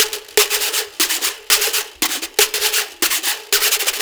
Scraper, Percussion Loops; Ambient Grooves

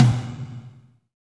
TOM TOM, Roland

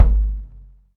DM5 Perc, Alesis DM5 drum machine

